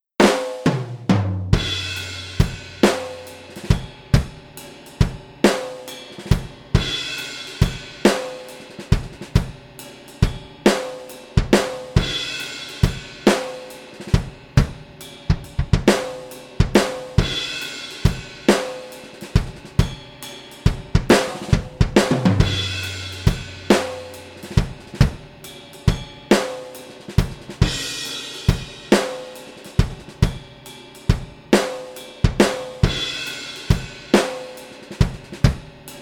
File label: drum